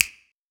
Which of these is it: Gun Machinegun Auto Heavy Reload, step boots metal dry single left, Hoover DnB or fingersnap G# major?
fingersnap G# major